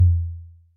old school verby kick single shot F key, Royality free kick drum one shot